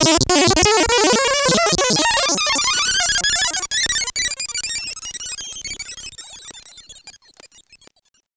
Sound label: INSECT, Special FX Twisted; USB Soundscan; AKAI